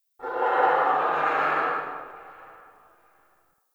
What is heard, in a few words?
Tree Distant Critters Angry; Ambience; Warframe; Duviri